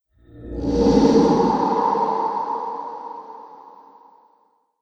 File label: Science Fiction Laser Ghost Beam Motion; Laser Sound Effect; Sci-Fi Laser Sound Effect